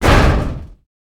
metal impact sheet

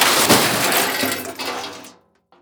metal debris; metal parts crash

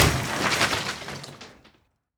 wall crash

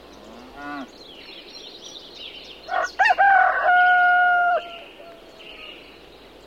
cow rooster; goat

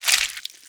bite; munch

